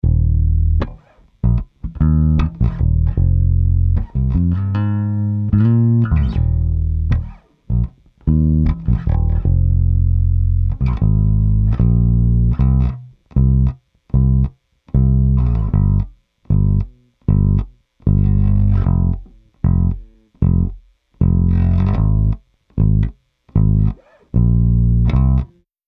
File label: bass plugins